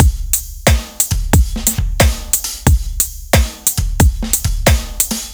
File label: Oldschool Drums A; Ударные и барабаны; Electric Jazz; 130 BPM; loop; Old School Drums A (Электро Джаз)